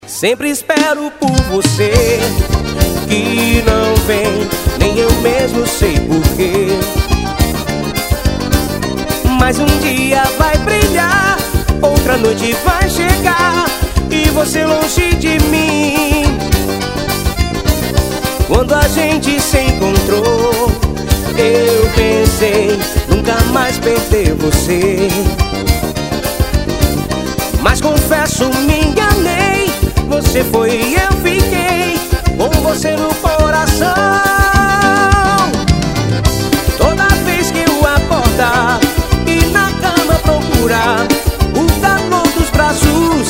forró ao vivo

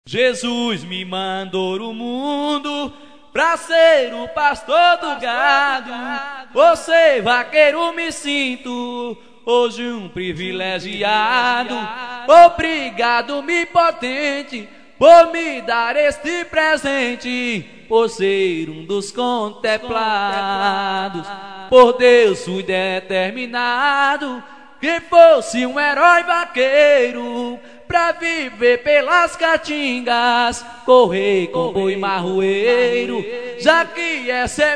aboio instrumental

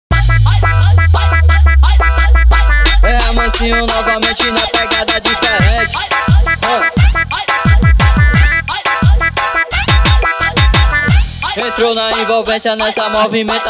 brega funk

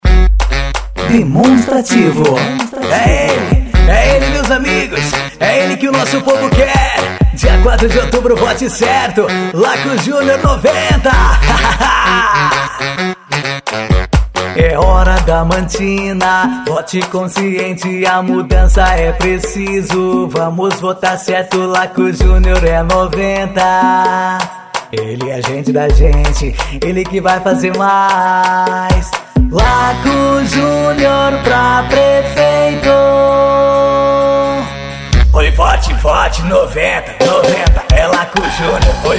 jingles politicos; studio lako

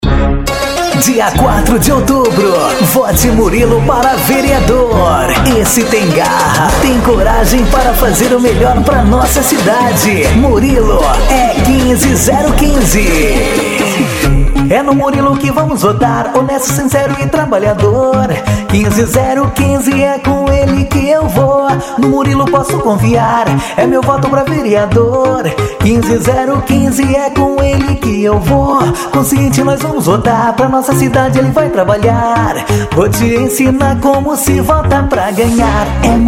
Jingles Politicos, Paródia Funk Para Prefeito E Vereadores

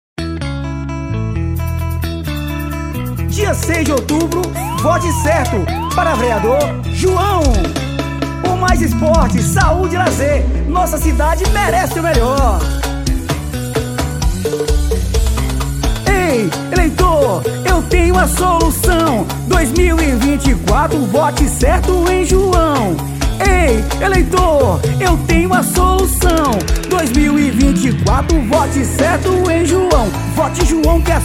pagodão jingle; vereador prefeito eleicao campanha politica

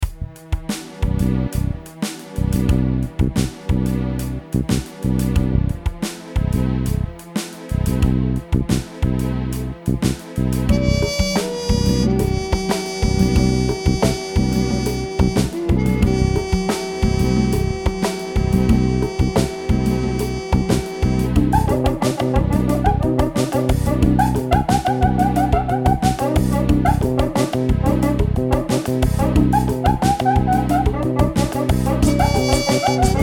vinheta de elevador

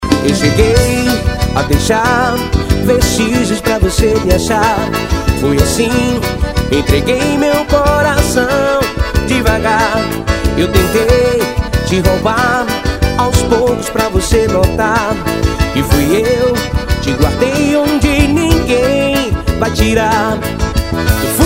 ao vivo, forró

